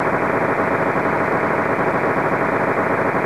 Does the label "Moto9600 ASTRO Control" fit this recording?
Yes